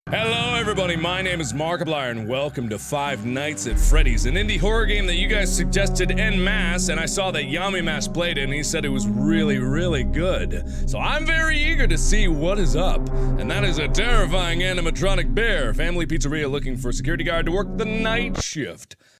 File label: Plier chant